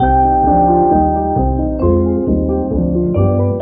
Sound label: keys, Loops, Sad